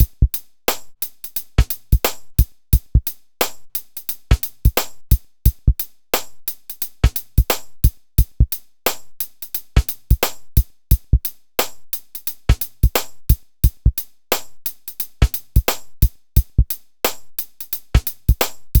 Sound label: Drums, Hip Hop